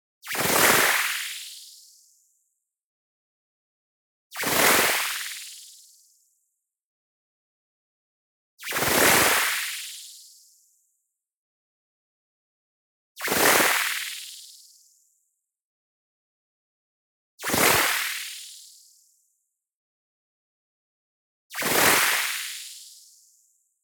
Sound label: whoosh, frequency shift noise bubble; boom library; Anime Essentials